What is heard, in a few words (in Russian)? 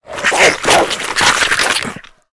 ozloblennij gromnij zvuk laya kojota